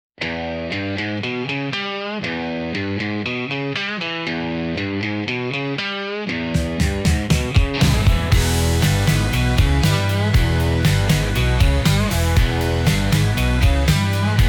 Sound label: C & W Rockin' Guitar Swings